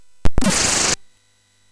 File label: Shuffle, MOS6581R3